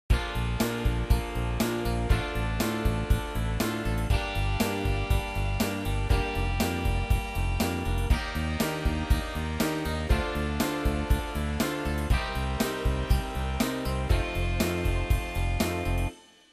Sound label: 80s rock